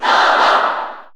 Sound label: Cheer Japanese; R.O.B.; SSB4; SSBU